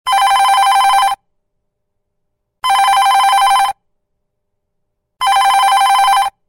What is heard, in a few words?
old panasonic phone ringing ringtone